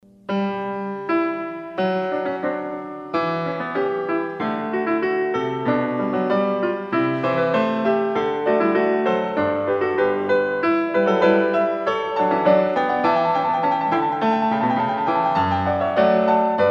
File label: Bach Goldberg Variations